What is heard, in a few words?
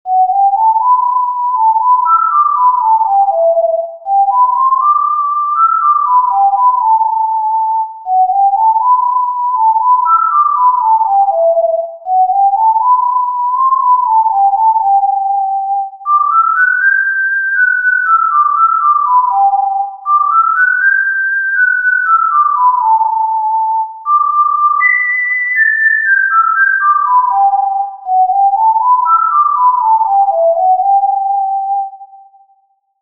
Danny Boy TIN-D Tin Whistle